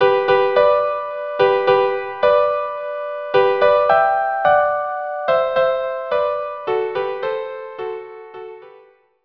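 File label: lullaby violin viola duo; brahms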